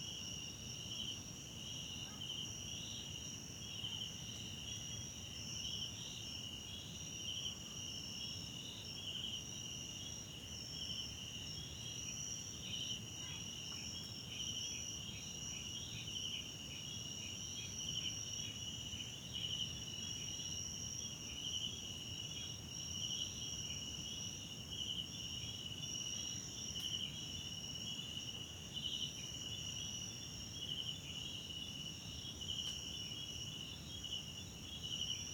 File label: South Africa Wetland Park Forest Lodge Night, SOUTH AFRICAN CRICKETS; Kosi; Tonschliff